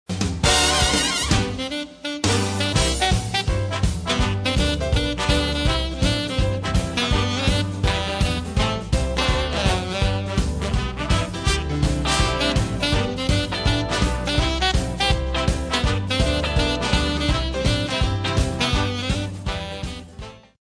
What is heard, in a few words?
Swing